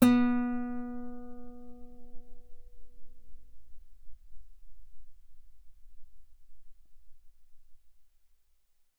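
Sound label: Guitar fortissimo sul B B3; mono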